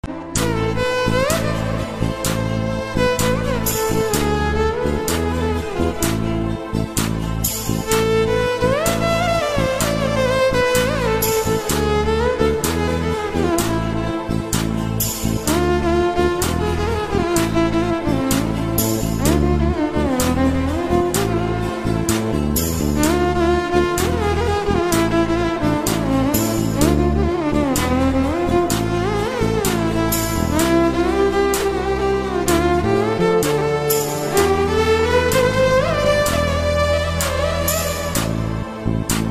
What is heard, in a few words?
romantic song ringtone; bombay; mani ratnam